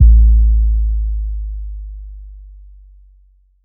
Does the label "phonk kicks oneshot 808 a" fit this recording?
Yes